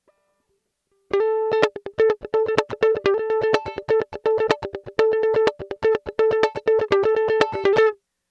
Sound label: mandolin comparison electric high single srm